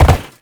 land perforated metal; Movement; Warframe; Uriel

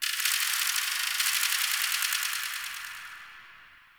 High Mechanics; Guild; Warframe; Misc Remaster

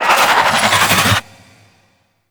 Light Cast; Fairy

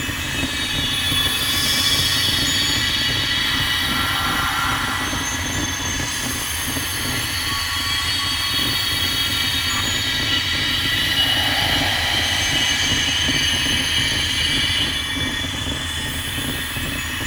Respawn Stone Loop; Ambience; Props; Warframe; Duviri

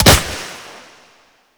Flak Cannon Proj Hit; Grineer; Weapons

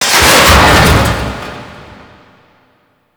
Infested Recharge Station Metal Tear; Gameplay; Warframe; Corpus Infested

